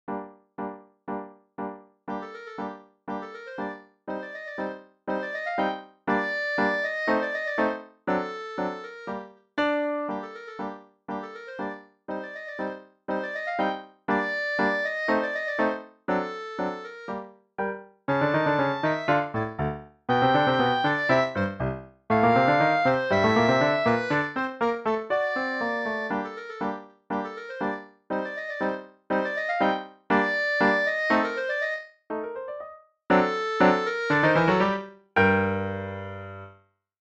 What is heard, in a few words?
catch the villian clarinet